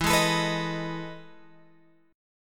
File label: E Major 11th chord; EM11 Chord {12 11 X 14 12 11} strummed